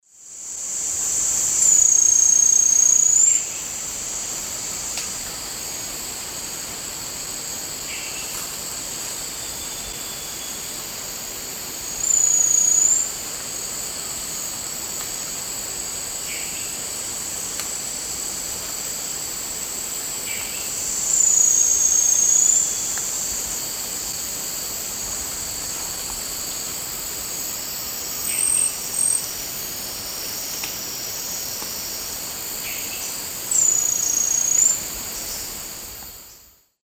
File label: Platyrinchus coronatus, song; S. America; Eastern Lowlands